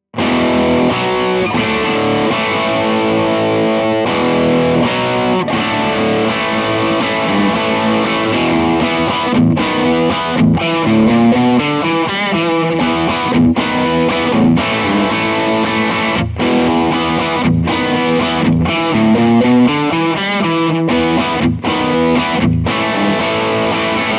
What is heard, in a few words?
rock chords; jensentone